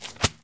Paper Drop Pile; SFX Kit; Foley - Misc

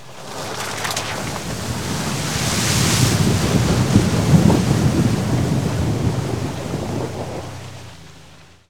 Auto Tires Splash Water; Automobiles

